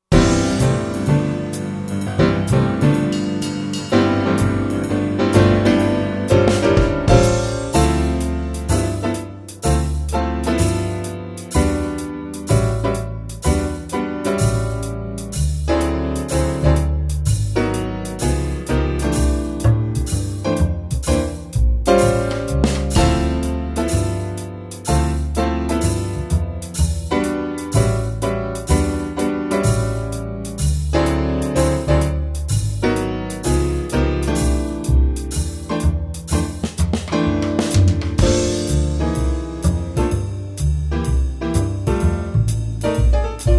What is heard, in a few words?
brass minus